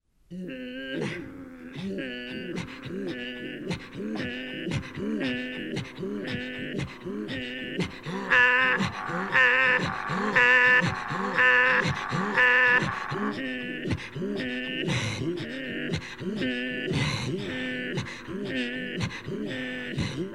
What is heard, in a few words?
Inuit Song